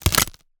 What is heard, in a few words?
switch button click high tech mechanical; Bluezone Corporation; Steampunk